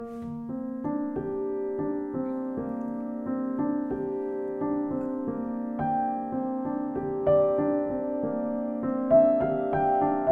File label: Grotrian Steinweg Carat, piano